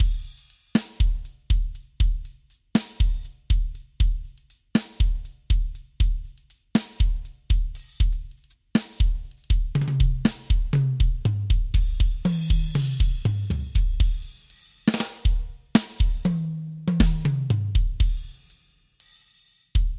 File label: SD Arrocha